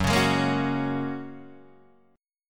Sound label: F sharp Major Add 9th, Guitar Open D